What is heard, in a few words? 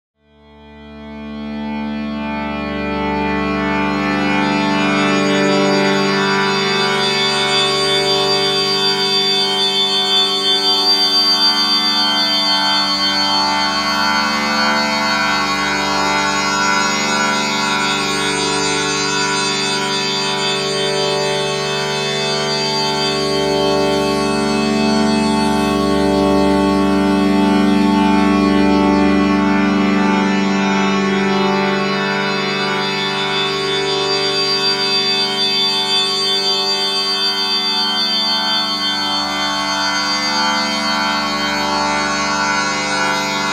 Long chord